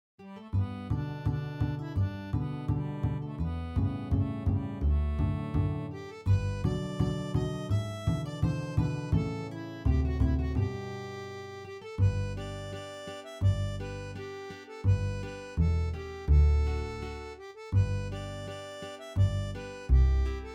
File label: banjo harmonica; Oh Susanna